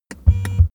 car seat massage engine short

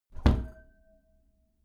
glass door close heavy glass clang

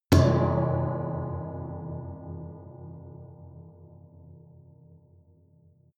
underwater metallic

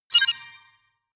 sci-fi bleep; soundpool

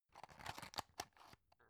drug close soft; berufe; arzt

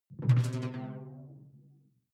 sci-fi alien splash detuned soft; swooshes-swishes

